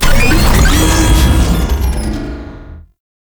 Hack Minigame Fail; Warframe; Duviri

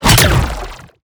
Hand Bomber Proj Fire; Warframe; ManInTheWall

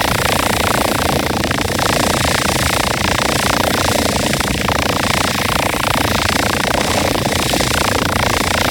Shotgun Beam Loop; Weapons; Warframe; Tenno; Revenant Shotgun

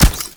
Rush Step; Warframe; Runner

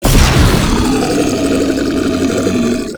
Flying Mouth Attack Inhale; Enemies; Warframe; ManInTheWall

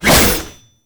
Shield And Sword Whoosh; Warframe; Grineer; Mortifor

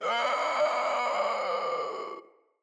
Infested Runner Death

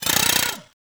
Ghoul Drill Punch; Warframe; Grineer Ghouls; Ghoul Drill Sergeant

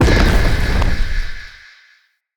Dodge Trap Burst; Enemy; Abilities; Characters; Circade

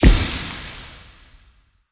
Blood Lust Hit; Fey; Founders Champion

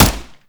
Twin Assault Rifle Fire; weapons; Warframe; Grineer

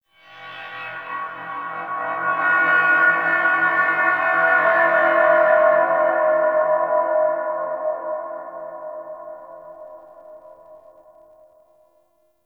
Distant Sentient Drone; Warframe; Tau

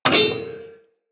Bullet Impact Debris Pipe; Weapons

